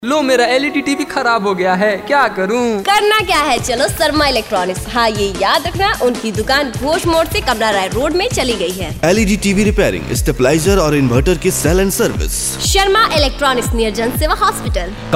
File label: electronic